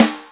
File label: Yamaha CS6, Drums